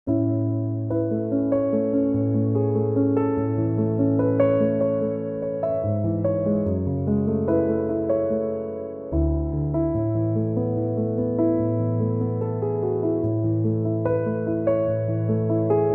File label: chill piano instrumental